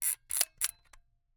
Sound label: Camera, Aimex SP500, point n shoot, plastic, wind film, ping; Eiravaein Works; Vaeyan IV